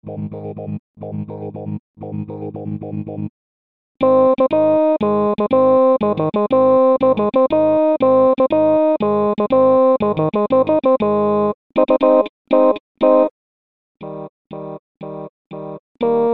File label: Fanfare